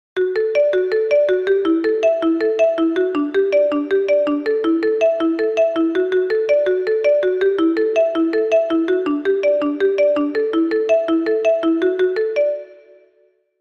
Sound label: xiaomi redmi ringtone